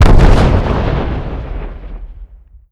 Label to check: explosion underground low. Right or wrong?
Right